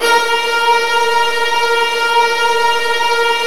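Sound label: viola tremolo A#1 right, Virtuoso Strings; ILIO; Kirk Hunter Virtuoso Strings VOL-2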